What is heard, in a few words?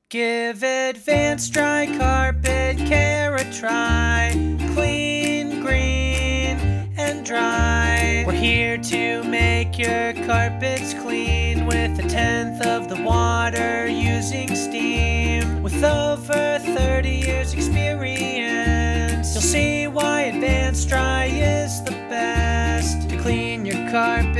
Jingle; Advanced Dry